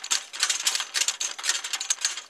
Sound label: light rolling; Angry Birds